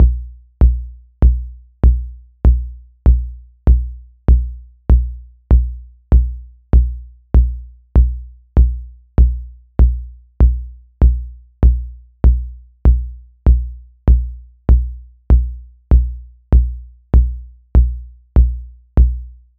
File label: delay kicks cr78 string oomph wet; aas; objeq delay